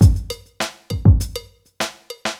drloop, drumloops, Vinyl Attack; Masterbits

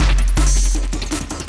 LOOP, Drum & Bass, 160 BPM; Ueberschall; Drum & Bass Resonance

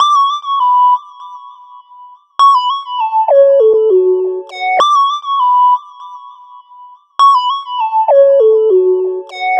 Old Finger Lead; Sonic Foundry (Sony Creative Software); Club Joints and Street Anthems

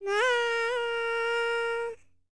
HIMALAYAN, World Voices; ILIO; Vocal Planet VOL-5

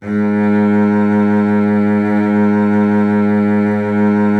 Virtuoso Strings; ILIO; Kirk Hunter Virtuoso Strings VOL-2; 90 s Sample CDs

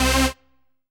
RAVE, RAVESYN

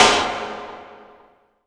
IMP SNR DRUM, Drum, Cinematic Impact

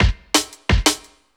LOOP, Drum & Bass, 174 BPM; Ueberschall; Drum & Bass Flash Trackz

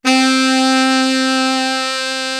big sax, M1

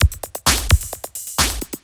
drt 130 electro, DRUMLOOPS, LOOP ELECTRO

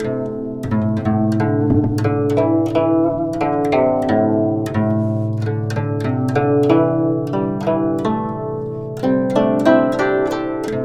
GUCHIN 90 C; INIS - Opium

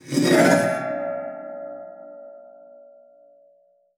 GLISS; Xsample VOL-7

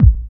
DR. LOOP, DRUMS; X-Static Goldmine 3

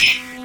DIZZ, anime sound fx, comic

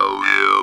Talk Box "oh yea"

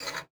GUITAR FX; Xsample VOL-2

